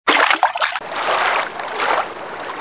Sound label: swim